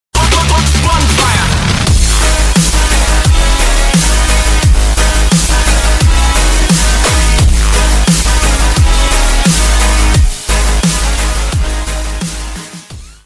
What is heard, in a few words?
bonfire, round end music